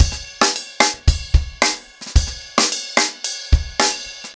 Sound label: Crash 110BPM, ACOUSTIC DRUMS; Sonokinetic - The Roots Drumloops